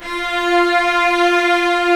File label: STR SEC; AKAI S-Series CD-ROM Sound Library VOL-2